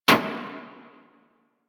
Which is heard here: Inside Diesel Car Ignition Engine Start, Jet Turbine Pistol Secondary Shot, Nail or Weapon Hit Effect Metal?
Weapon Hit Effect Metal